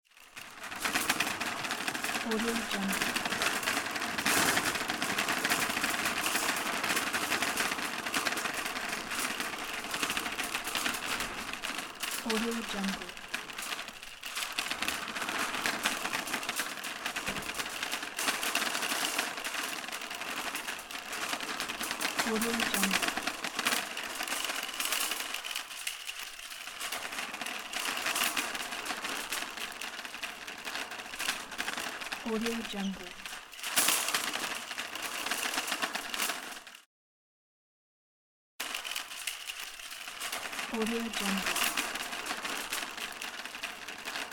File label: rolling trolley on pavement